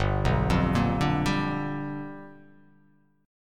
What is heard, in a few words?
G 7th Sharp 9th G